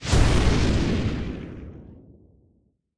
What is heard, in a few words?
big magic; Epic Toon FX